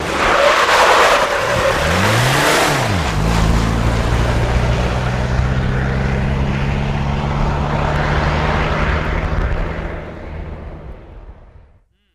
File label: Jeep Chrke 84 Skid Sta; jeep cherokee 84